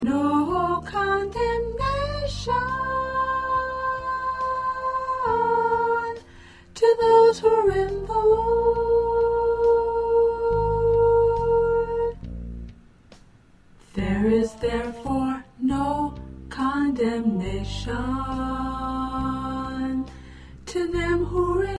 Soprano

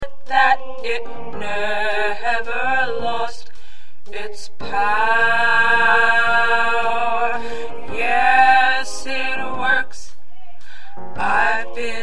Tenor, choir parts

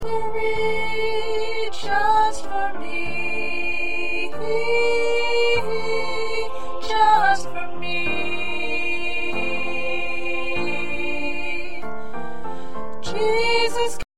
2nd Soprano, choir

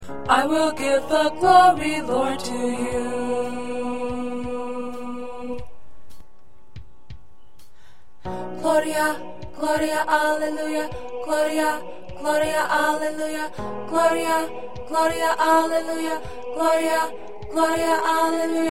Gloria Soprano

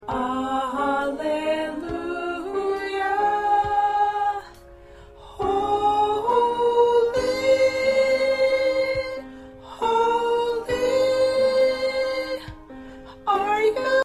SATB Soprano

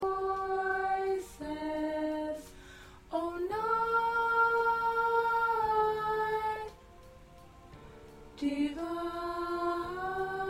Alto

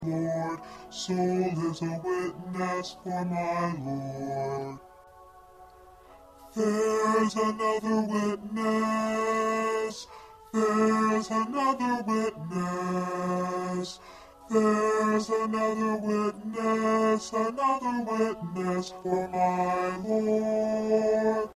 1st Bass

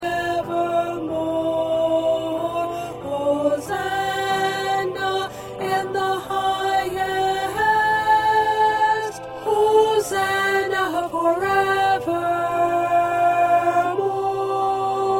2nd Alto

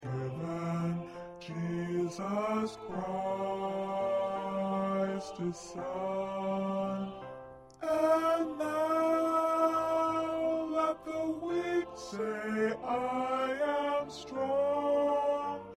Tenor, choir

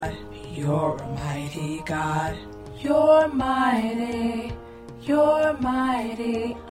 Tenor, choir parts

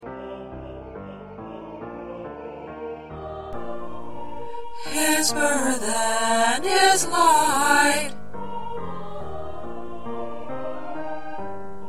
Tenor

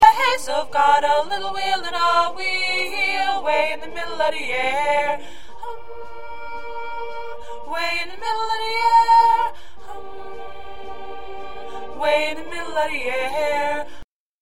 1st Alto

